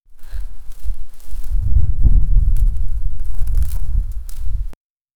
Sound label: flickering fire distant, cliffs